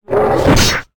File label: attack; DAGON; Mob